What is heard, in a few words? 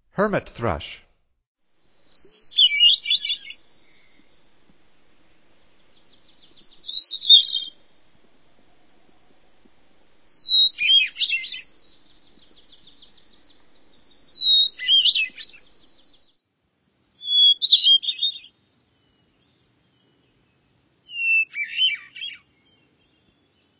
Hermit Thrush; North America; Stokes